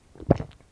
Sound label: swallow